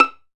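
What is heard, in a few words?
PIZZ VIOL, Solo Violin; E-MU Producer Series Vol. 2; More Studio Essentials; Composer; St. Arco Solo Violn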